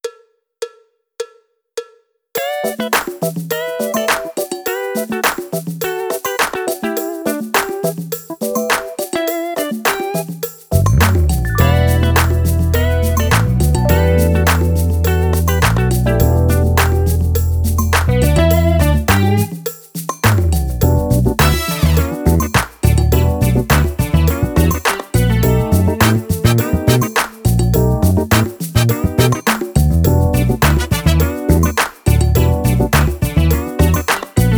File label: Funk drumless